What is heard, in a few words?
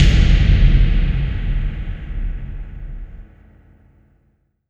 BD-FX, Dance Mega Drums; Best Service